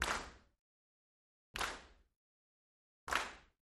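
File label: Crowd 50 Int Rhythmic, large int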